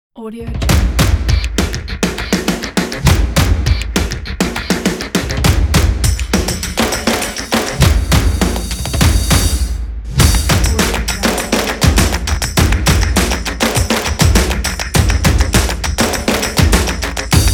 drums percussion stomps

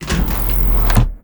door spaceship small; soundmorph

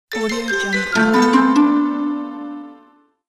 ancient game over ding

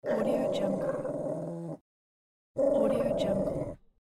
dog growling

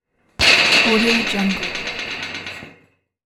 metal scrap rattling; chicasound

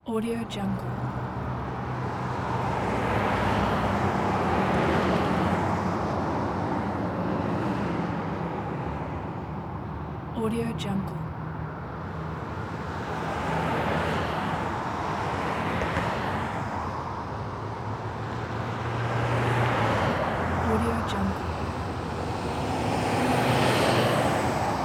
traffic ambience

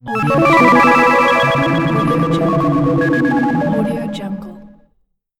retro melody noise

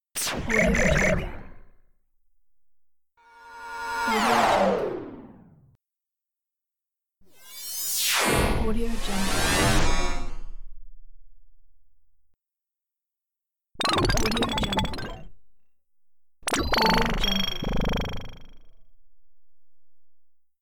sci fi film sound

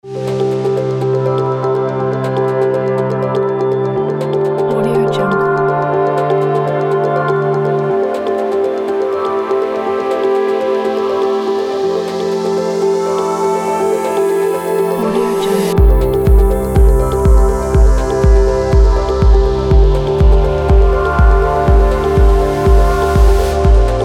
ambient corporate tech

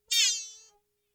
robot vocal synth like high pitched whiney

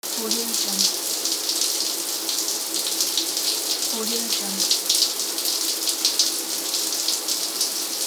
shower

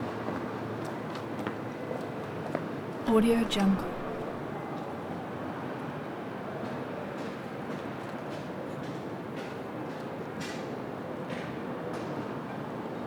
alley prague old town distant violinist

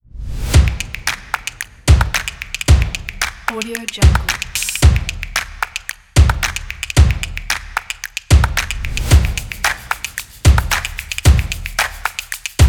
drums on

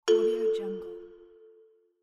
notification sound

